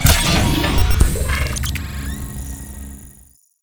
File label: med bay place; garrysmod